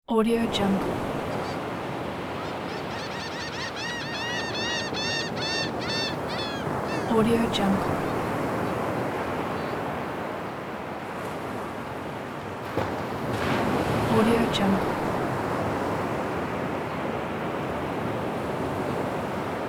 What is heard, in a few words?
sea waves with seagulls